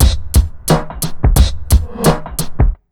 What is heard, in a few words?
88 BEAT, Pure Electro, BEAT MIX; USB Soundscan vol.03; AKAI